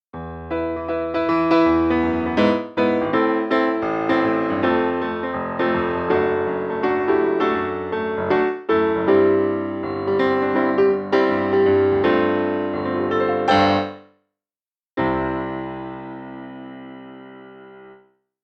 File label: Accomp; Simba; FOLK